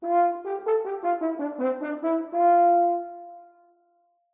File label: Wagner Tuba